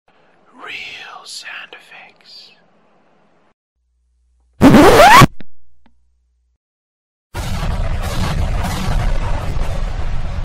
Record needle scratching across record sound effect; realsoundFX